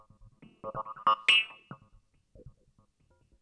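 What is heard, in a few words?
PERCUSSION ELECTRO 140, Techno Drum; Techno2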